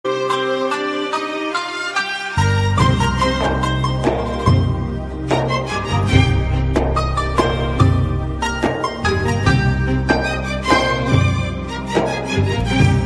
Relaxing Ringtone Turkey Ringtone

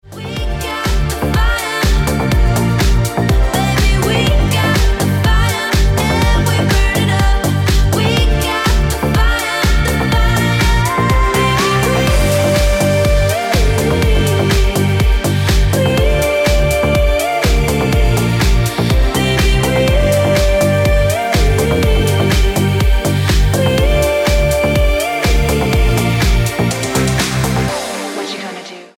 Ringtone Deep House Music

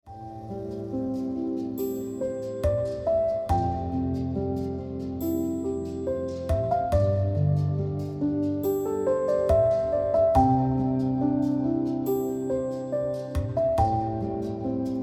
Ringtone

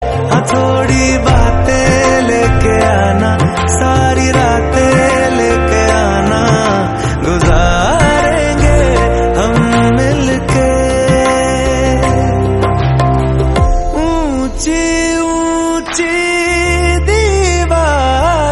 Hindi; Yaariyan 2

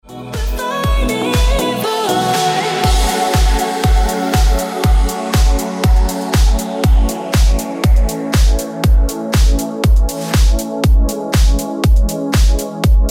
Ringtone

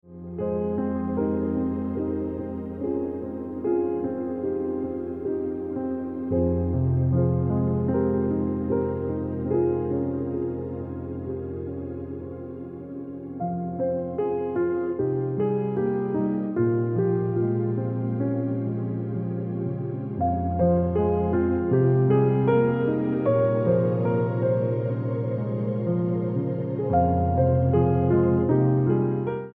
Piano Music

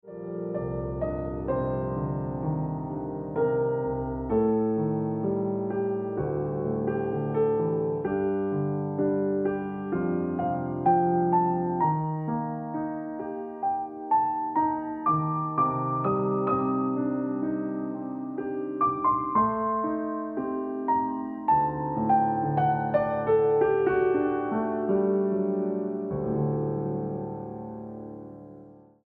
Ringtone Piano Music

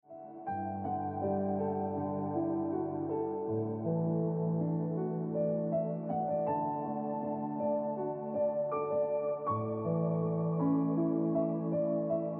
Ringtone Piano Music

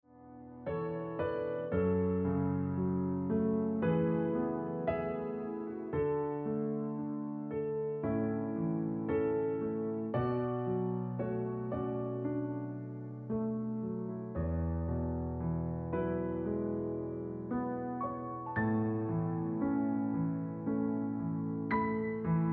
Ringtone Piano Music

